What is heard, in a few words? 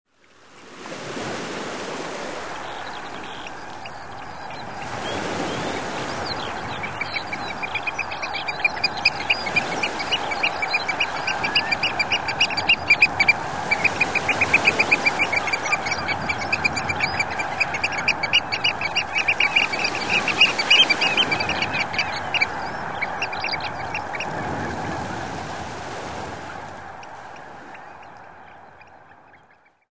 ostralegus; FAR